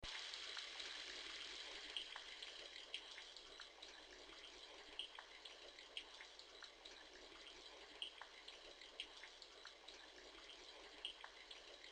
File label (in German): Regen